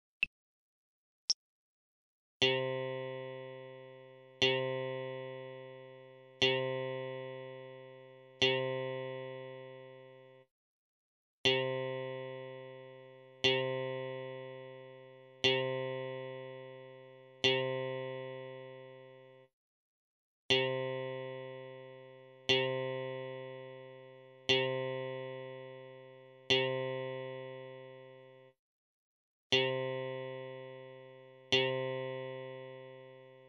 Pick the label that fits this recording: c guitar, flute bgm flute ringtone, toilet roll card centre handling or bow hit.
c guitar